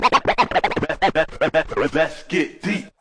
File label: lets get deep, Scratching and Turntablism; DRUM LOOPS and BREAKS